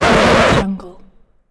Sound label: record scratch sound